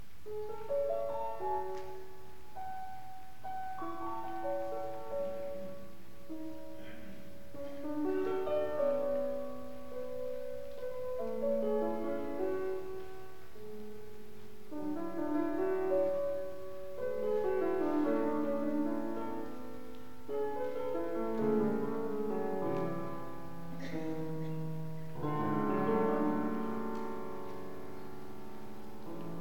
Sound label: Piano Concerto